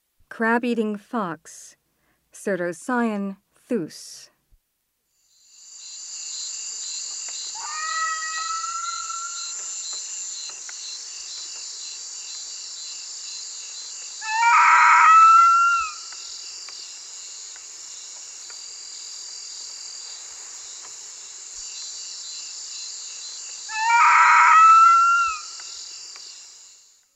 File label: Crab-eating fox; S. America